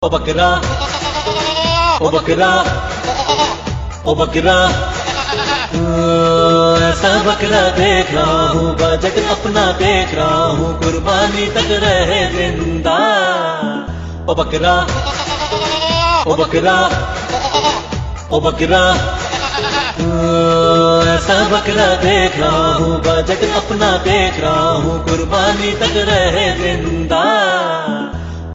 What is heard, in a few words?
Goat Song